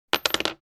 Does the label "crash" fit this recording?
No